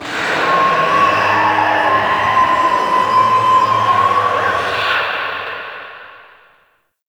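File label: Infested Distant, Ambience; Nineteen Ninety Nine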